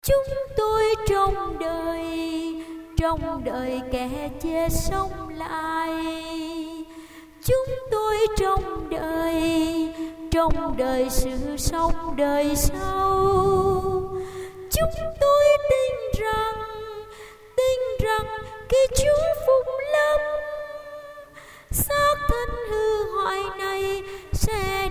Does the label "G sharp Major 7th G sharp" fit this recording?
No